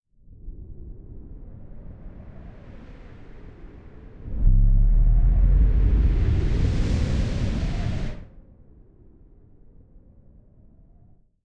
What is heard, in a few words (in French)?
vent fou lowpass